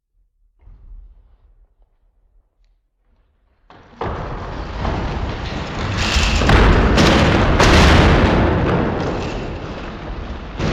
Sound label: Earth Demolition Crack Bit Processed; Homejrande